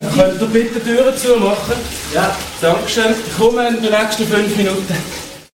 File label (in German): Tuere zu machen; alpspektakel